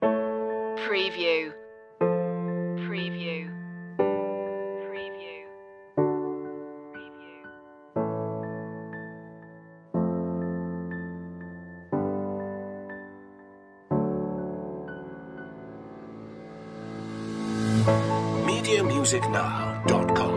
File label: bell shoppe no bells or xylophone